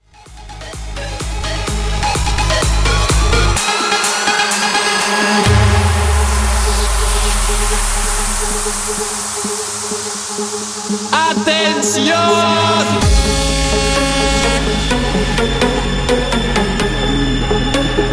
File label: Epic Festival Mashup 127 Bpm Clean